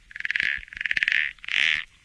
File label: small frog, random animals, frog groups; Left 4 Dead 2; Source Engine